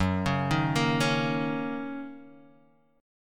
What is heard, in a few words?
Gb 6th, Guitar, Standard; arpeggiated; 2,4,1,3,2,x; JGuitar